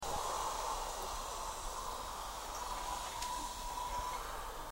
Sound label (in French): degivrage